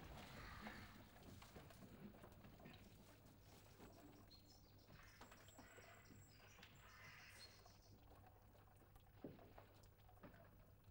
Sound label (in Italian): Atto Terzo Recitativo; KV 196 La Finta Giardiniera - 1775; Il Podest¨¤, Serpett; Mozart; Operas